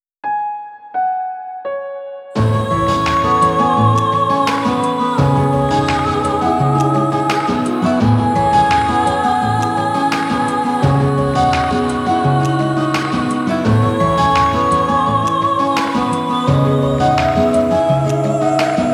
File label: love slow duygusal; By Burak Sound Bank; dramatic